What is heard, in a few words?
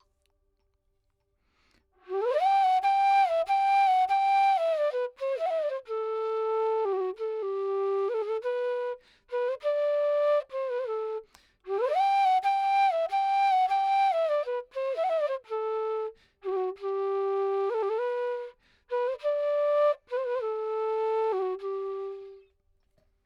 Dry 4 4 G 100